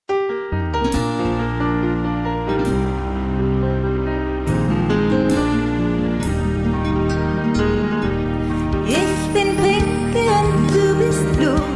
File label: singing Kids App